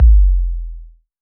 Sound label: 808 BD long, Roland-808 Drum Hits Bass Drum